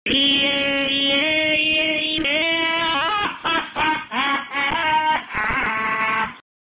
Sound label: Ghost Yeah Harmony